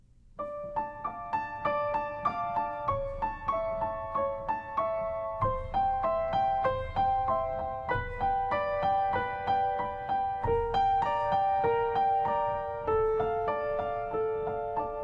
Sad Music

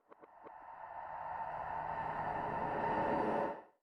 chest reverse

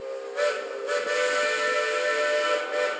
train whistle railroad

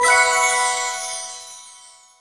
ui progress Big; Academy

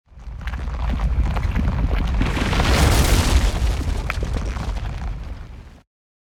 Magic Rock Shake; NPC Mago

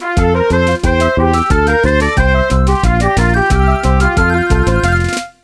music jingle bonus clear; Donkey Kong Country Returns HD

